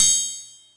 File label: Triangle Open, Yamaha PSR E305